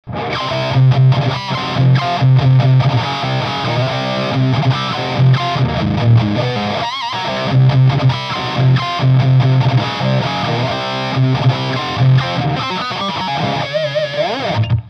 Over Drive SM7 Off Smooth, Ibanez Guitar Effects Pedals